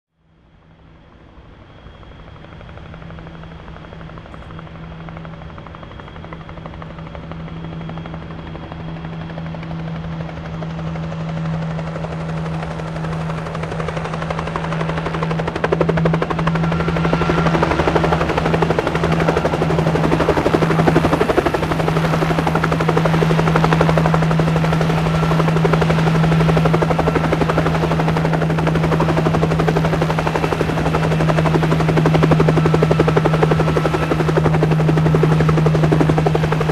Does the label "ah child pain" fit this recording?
No